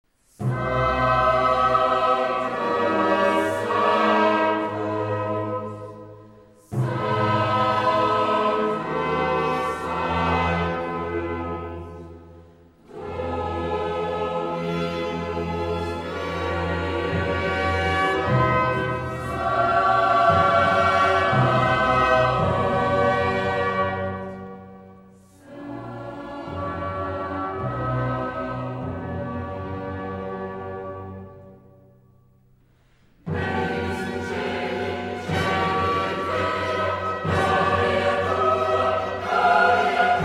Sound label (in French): concert choeur sanctus; activites culturelles et sportives; saison hep vaud